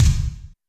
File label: kick, Zoom MRT-3B